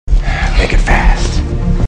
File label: jump; freak fortress 2; joker